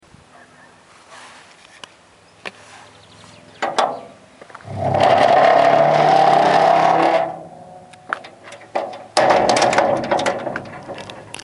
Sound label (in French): PORTAIL; NUAILLE